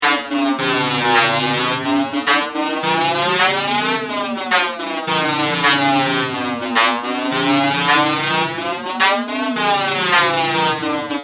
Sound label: Holmes jet flanger